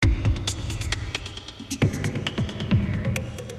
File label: Crazy Driver 105